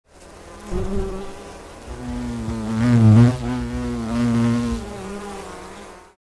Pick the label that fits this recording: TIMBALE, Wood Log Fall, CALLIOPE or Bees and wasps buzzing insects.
Bees and wasps buzzing insects